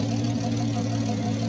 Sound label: motor sound